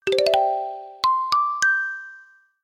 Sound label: message, notifications; Gionee; Pioneer-P1